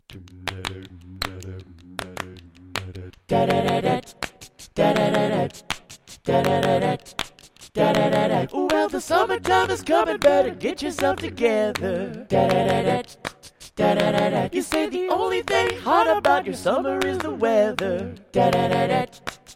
Promo Song

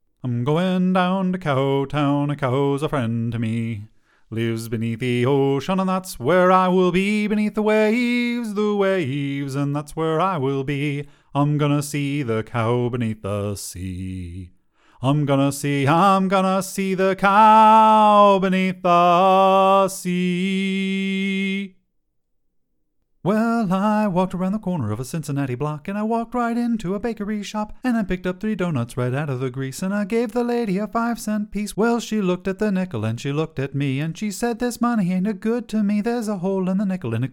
singing

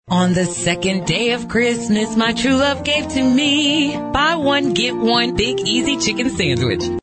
Singing

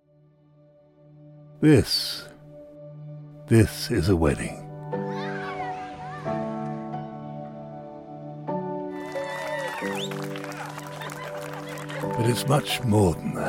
Wedding music; ROLTA; Professional Football Hall of Fame

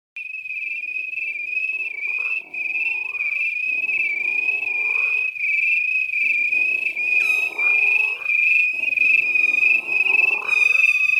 Crickets Frogs Insects; Geremy Dingle

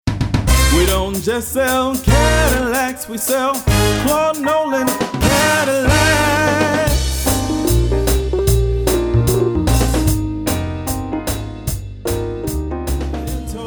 Jingle Montage

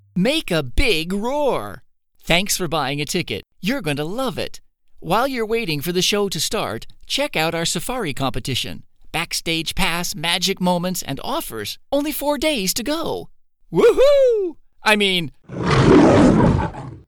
Excited Teenager Roar

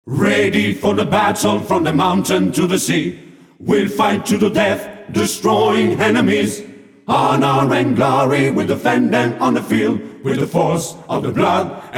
A CAPELLA

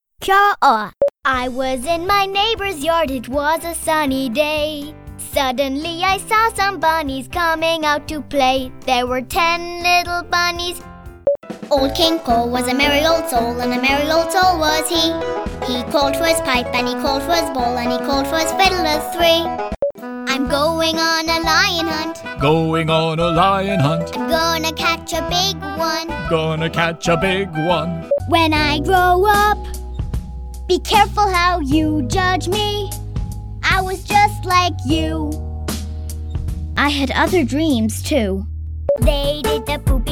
SINGING

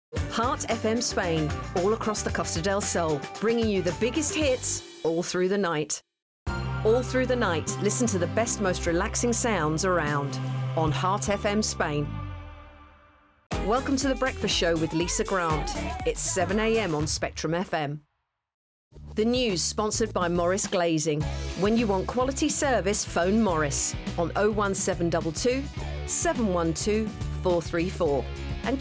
Radio Jingle

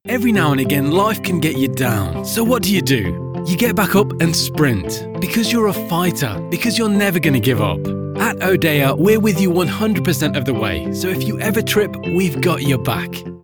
music

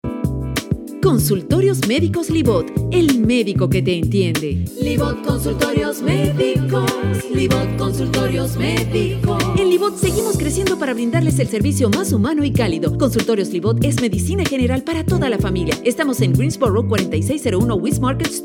JINGLES

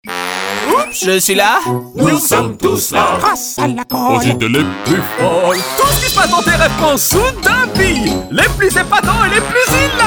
SINGING, French Male Voice; Pritt Tv Spot

